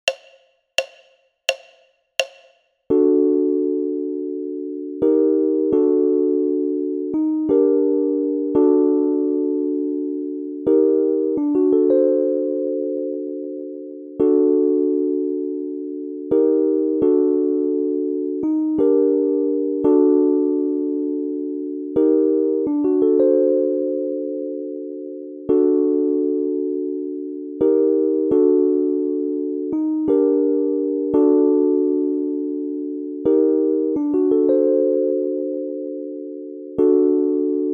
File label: Piano